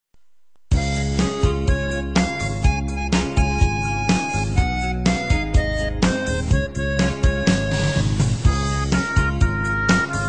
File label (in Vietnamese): nhac khong loi beat